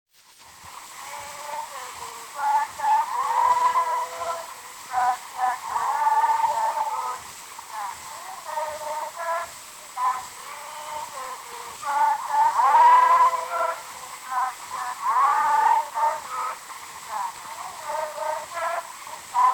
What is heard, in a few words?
Zulu recordings; Father Franz Mayr